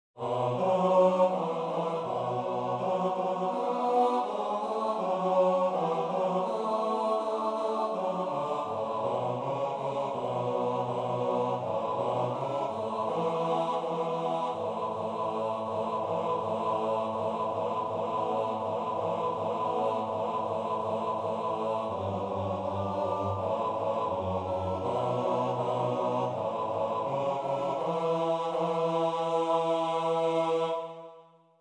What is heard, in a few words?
bass